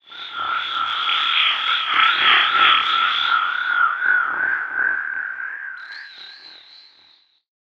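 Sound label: deep house FX, 125 bpm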